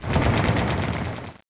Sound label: Rnd Noise; The War Engine